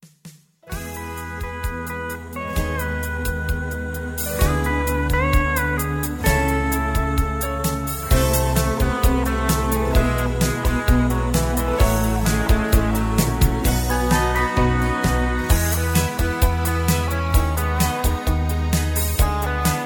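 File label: Instrumental with background vocals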